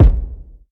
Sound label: verby bass drum f key; kick drum samples